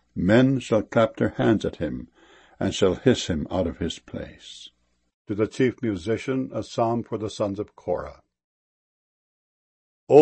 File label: clap